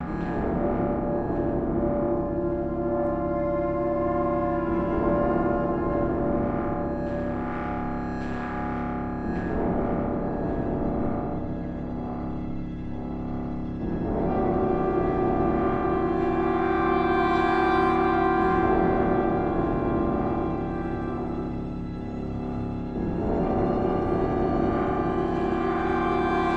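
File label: music, ambient drone; transformers war for cybertron gamerip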